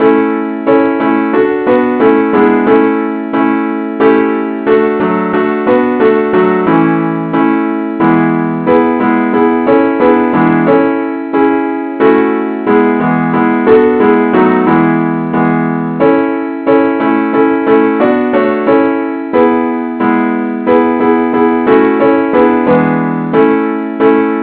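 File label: advance accompaniment key a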